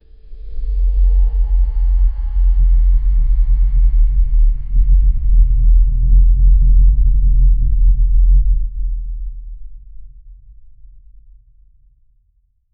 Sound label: scary rumble bass build up; SFX Engine